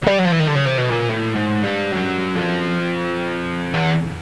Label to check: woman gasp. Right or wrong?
Wrong